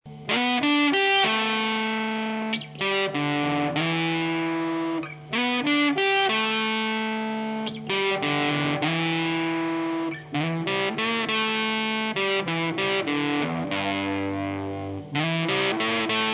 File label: Fuzz Face